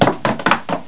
RUN WOOD; MOVEMENT

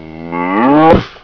MOOF, ANIMALS

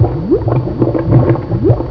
oil boil

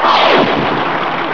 WHOOSH

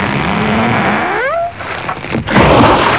timber

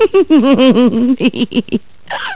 LAUGH FEM; PEOPLE

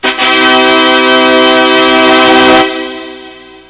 TADA

